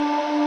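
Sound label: kawai k1 SHAKUHACHI sustain; wavetables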